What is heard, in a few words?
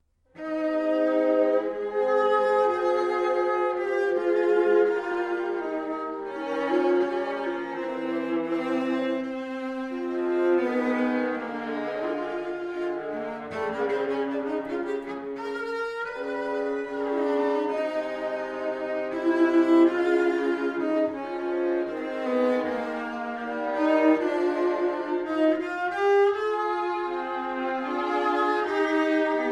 trio